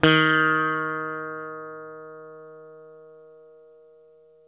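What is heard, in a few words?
SITAR D#2 right, coral sitar, guitar; East West; Steve Stevens Guitar Samples Collection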